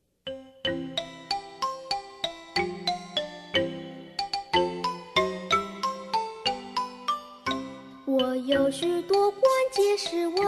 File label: words and music, Chinese; childrens songbook